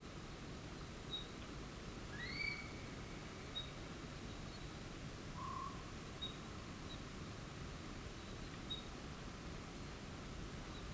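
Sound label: wail, nocturnal flight call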